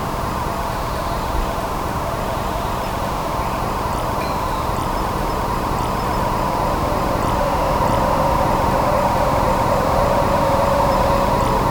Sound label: sounds of a train nearby, rural